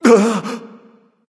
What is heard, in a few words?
surprise; smite; voicepack; rama